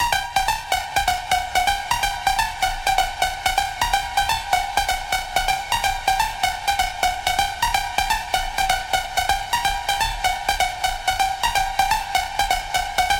Serum Pluck

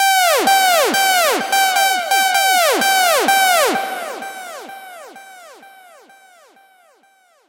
Serum Siren

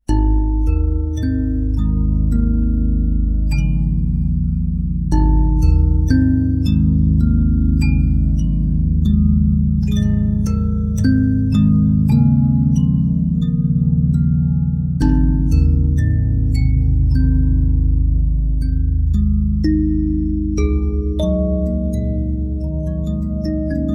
Chthonic Lullabye, Mbira, thumb piano; KONTAKT

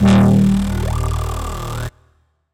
Serum MIDI Growl

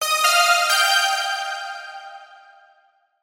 Serum Ear Candy

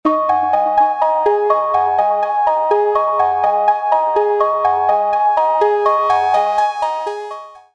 Analog Arp Dm 122

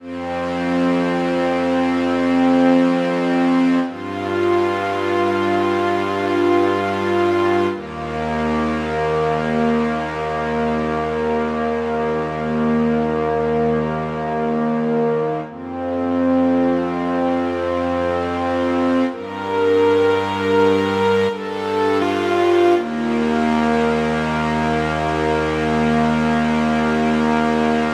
Serum Harmony

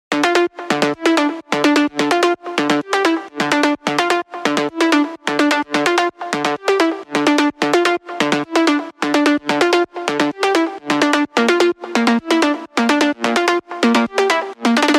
Serum Lead

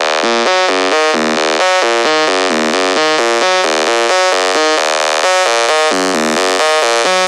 Lead Layer; Serum

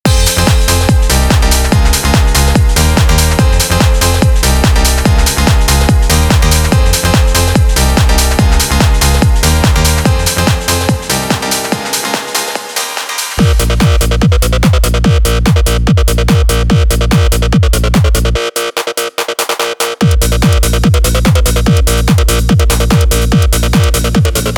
Dj Mix

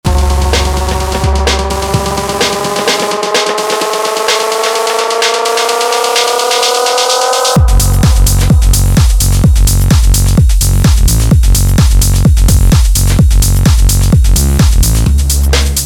Tech House Mix

